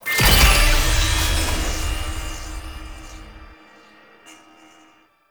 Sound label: Mode Start End; Warframe; Nineteeen Ninety Nine; PvPvE